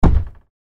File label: reel Stop; Dynamite Digger Eureka